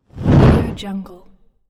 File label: fireball